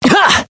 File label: Voice attack; curio